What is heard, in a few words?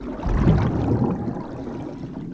Diving swim loop